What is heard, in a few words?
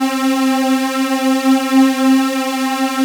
OB BIG P; Synclavier Sampler Library